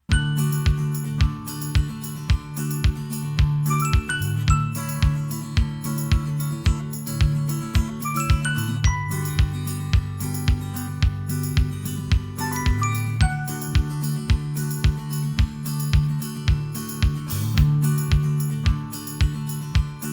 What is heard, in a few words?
Instrumentals; Pony Music Archive